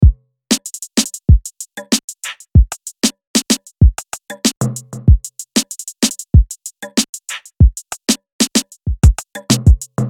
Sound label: Dancehall & Afrobeats (95BPM)